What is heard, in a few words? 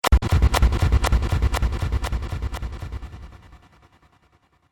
Trailer Hit Timer Impact